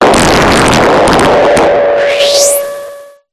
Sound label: Glitch Decimated; Glitch Factory 01; sound spark